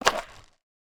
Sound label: skateboard tailpop; smite; osiris